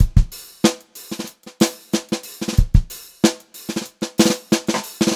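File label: groovy variation, Funk-Groove Drumloops; USB Soundscan vol.38; AKAI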